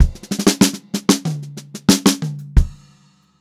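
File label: STRAIGHT FILL, Funk-Groove Drumloops; USB Soundscan vol.38; AKAI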